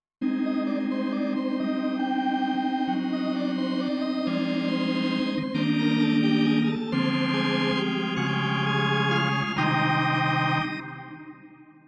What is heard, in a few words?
Organ; NumaOrgan2